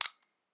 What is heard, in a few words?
Gun Little Hammer Trigger Pull Metal; Nova Sound